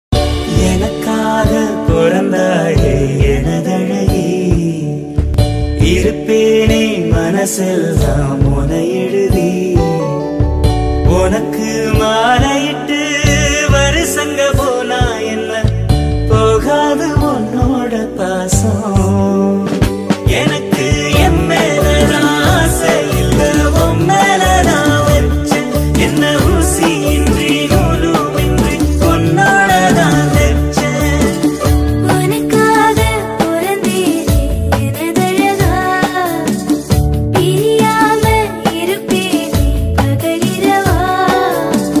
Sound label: love ringtone